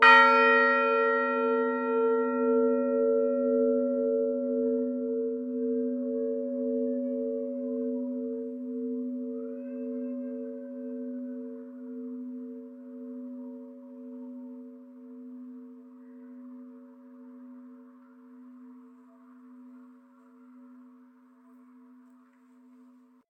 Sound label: old seventh, bells; brighton